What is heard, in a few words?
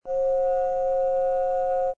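C5 with F sharp 5, multiphonics, flute; modern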